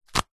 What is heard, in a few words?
foley wallet catch; zapsplat